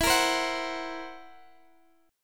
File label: Fsharp 7th Flat 5th, guitar chord